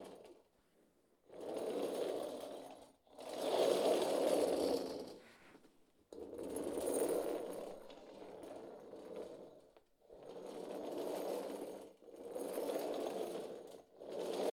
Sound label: Trolley Over Floor